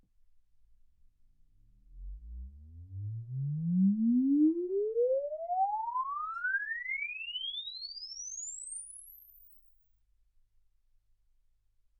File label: exp swept sinus 10Hz 24kHz